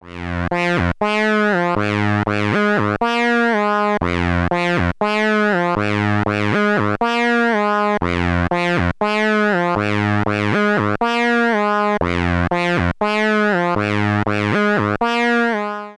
korg minilogue lead

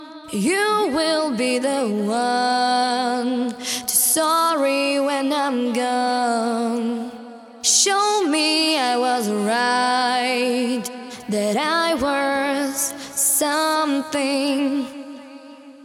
VOCAL WET

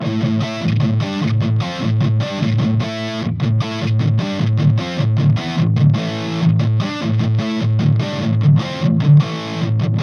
laroqua 212 studio rock closed

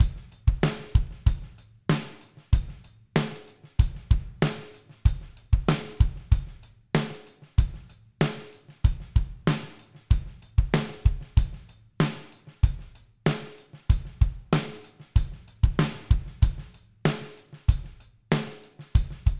ayotte standard kit; toontrack the warehouse rock sdx

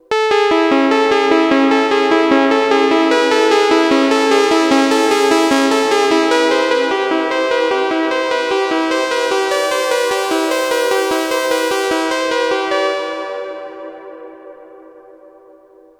Novation Peak test arpeggio

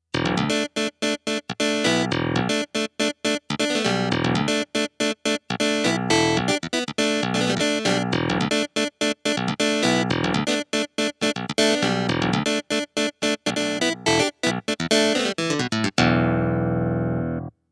clavi; Casio PX-S3100

